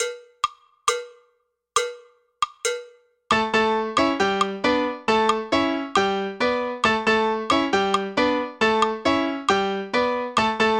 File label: tumbao fast